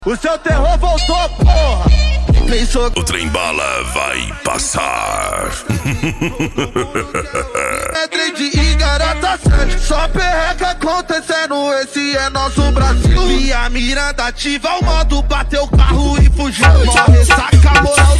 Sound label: funk mega bass